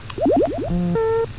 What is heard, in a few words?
warble beep